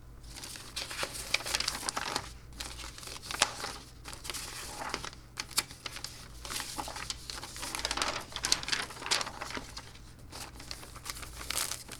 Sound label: Paperwork